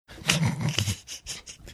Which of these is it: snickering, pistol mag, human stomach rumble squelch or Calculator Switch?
snickering